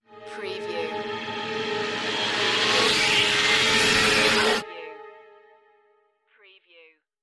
Trailer Production Elements - Effect - Crescendo, Sweep, Cold, Climax